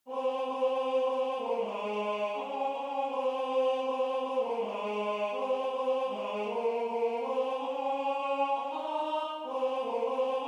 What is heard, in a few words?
Alto